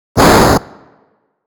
black hole shooting; dogma

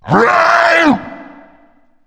war fire scream

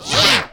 cute grunt

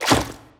frail spit

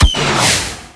rocket launch tiny

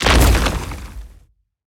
gnawed leaf stone

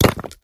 stone impact

